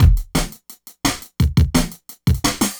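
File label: drums, Breakdance 172 B minor; Big Fish Audio; Hardcore Breakbeat Action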